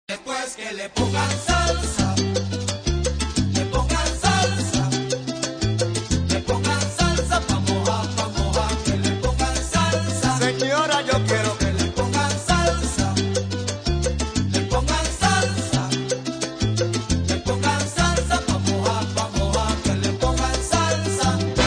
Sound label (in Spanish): salsa